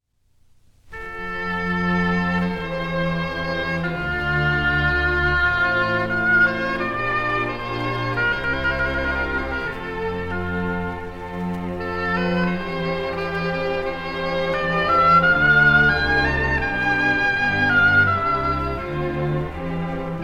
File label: oboe; corelli; op5 n10 concerto oboe